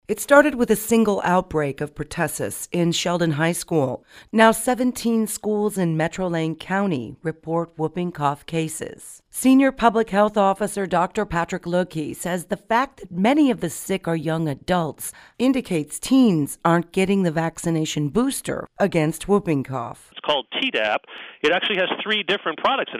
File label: COUGH